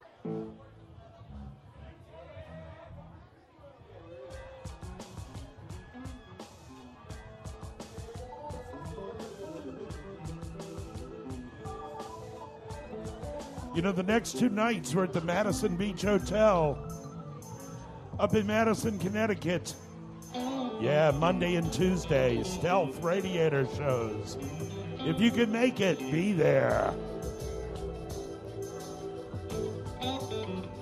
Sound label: tuning